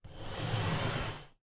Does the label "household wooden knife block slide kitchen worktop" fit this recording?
Yes